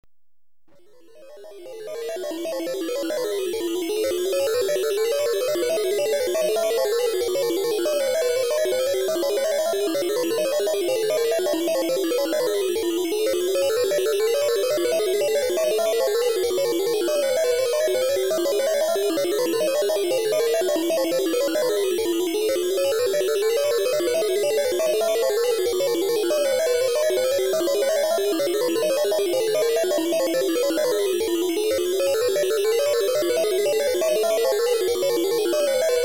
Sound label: Talking Computer (Mid Pitch), Electronically Generated Sounds; BBC Sound Effects Library